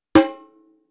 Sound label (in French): Repinique claque digital